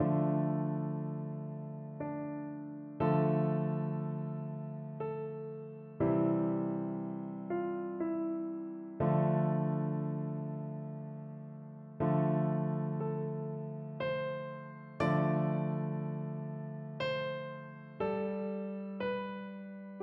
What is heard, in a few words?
scarborough fair, piano